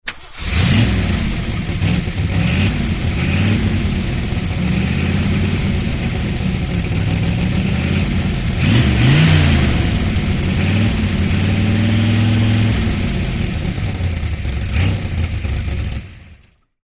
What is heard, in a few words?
Vehicle